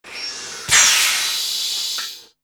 pickup; jumpmod

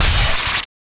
spikes spine

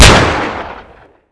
xm1014; cstrike; ZP CRISTIAN; ZPB WEAPONS

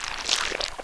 tentacles holster

